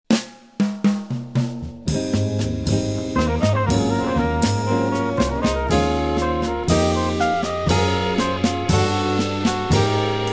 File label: minus melody